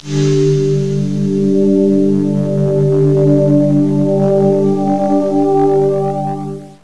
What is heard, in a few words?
swicsh